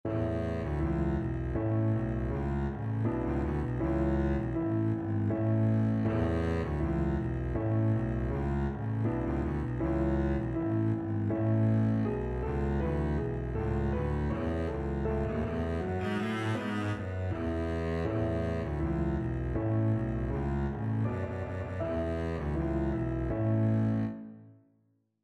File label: deck the halls, Double Bass